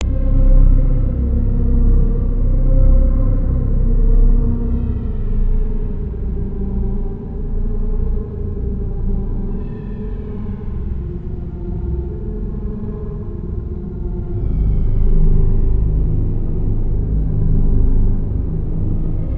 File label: hymn